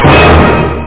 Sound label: orc hit; Loop Scream Tracker Sample